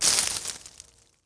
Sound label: bush snap; Star Wars Obi Wan; XBOX